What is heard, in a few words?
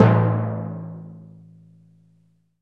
Timpani a2; BGM; Nintendo; July 2020 Gigaleak